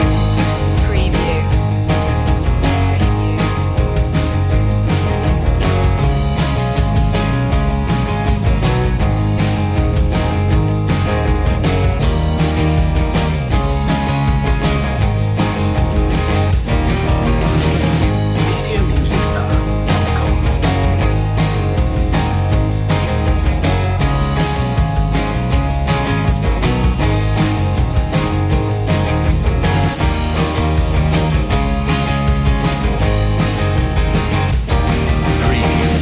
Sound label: surf loop